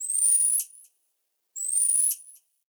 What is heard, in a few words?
Night Zone Tree Critters; Warframe; Ambience; Eidolon Plains; One Offs